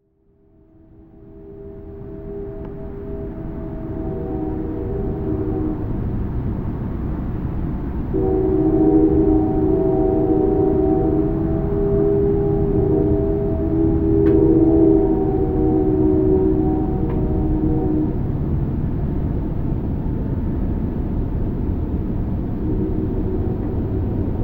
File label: Train Duet; Postcard 1